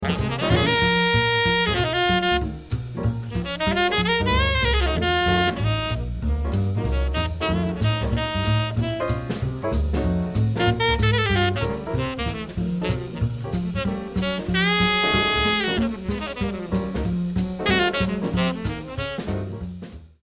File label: Tenor Solo